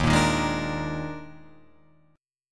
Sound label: Eb Major 7th Suspended 4th Eb, Guitar, Open F; EbM7sus4 strummed